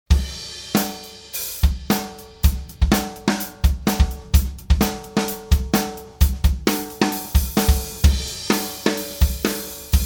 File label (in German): C414 OH Kick Snare ganzes Set; mit Kick- und Snare-Stützmikros; mastered; Glyn-Johns